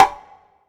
SR S CONGA, Drum Mashines, SR-16 DRUMS; EdgeSounds

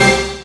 hit three; E-MU Formula 4000 Series Vol. 2; Dance Hits

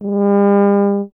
BRS TUBA, Solo Instruments

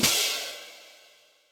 H Cym Opn, Drum Machines, Yamaha DTXpress, Cymbal

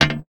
Percussive Hit, contact mic

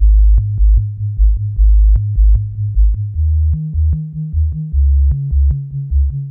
bass, Hardcore Breakbeat, 152 Abmaj; Big Fish Audio; Hardcore Breakbeat Action; Robot Live

